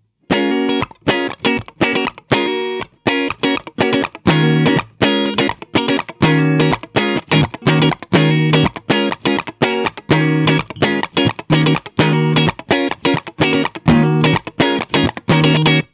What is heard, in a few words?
Soft Limit Telecaster Fender Dual Showman Sennheiser e606; Apogee Duet